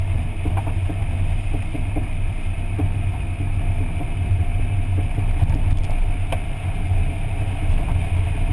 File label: loose lug nuts